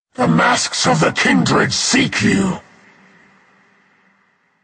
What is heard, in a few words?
Demonic